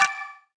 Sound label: ui gem Counter LOOPEND